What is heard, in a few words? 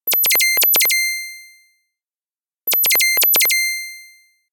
swish